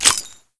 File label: grenade pin pull; weapons